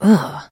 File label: trader sigh; 商人杰恩（七日杀）